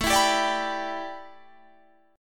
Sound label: A 7th Suspended 2nd Suspended 4th Guitar Standard; A7sus2sus4 strummed